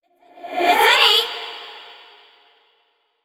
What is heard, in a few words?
VO cheering; yamiko